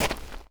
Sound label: dirt run, footsteps; chief